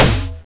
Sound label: snare